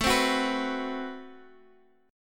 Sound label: A Minor 6th Add 9th, Guitar, Half Step Down; Am6add9